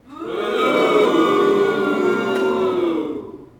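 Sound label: boxing crowd small boo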